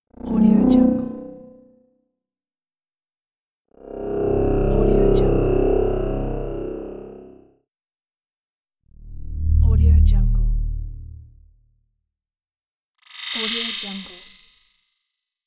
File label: alienship scanning